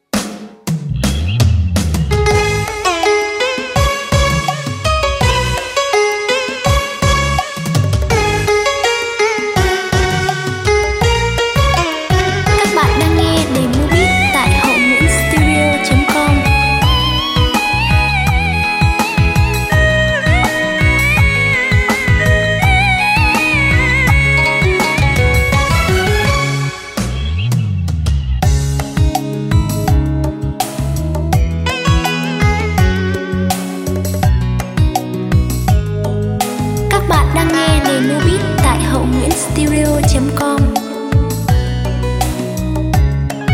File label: beat, playback